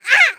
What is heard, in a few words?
Imp Throwing